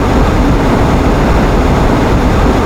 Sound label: sci-fi vehicle spaceship jet engine loop; Space Wave Race